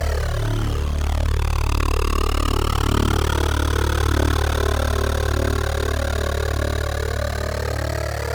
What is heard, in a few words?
115 D Drone Future Shock; Zero-G - Future Wave; Drones